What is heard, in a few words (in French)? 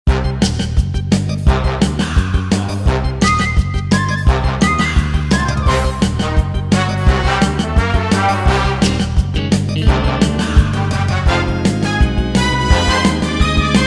musique